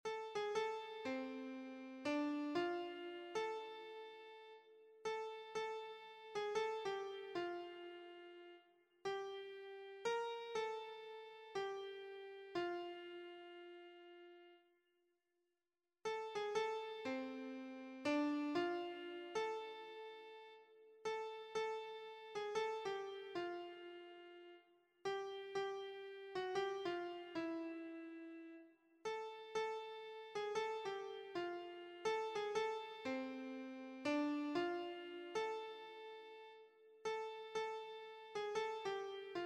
down by the riverside keyboard

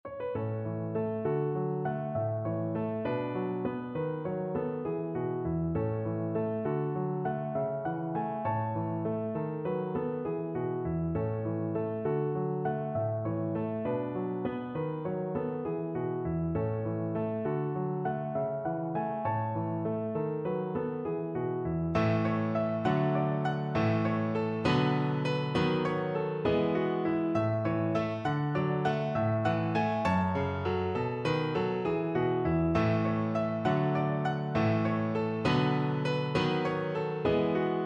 Sir Roger De Coverley, piano

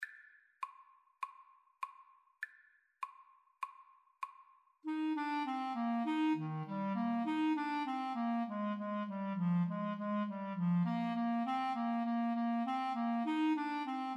jingle bells, Clarinet Duet

